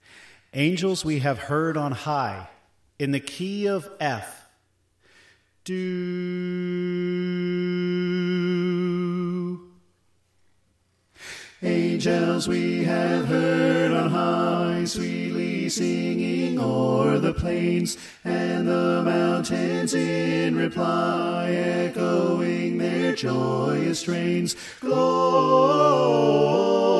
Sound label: Soprano Left; Angels We Have Heard On High